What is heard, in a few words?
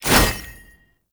gunbrella aim transition out; Weapons; Warframe; Tenno; Koumei